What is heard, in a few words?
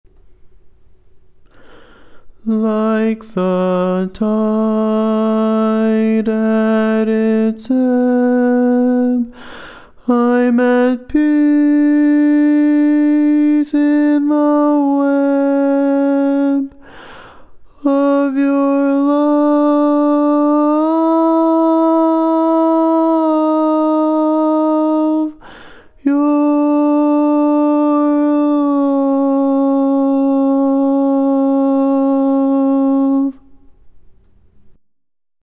barbershop tags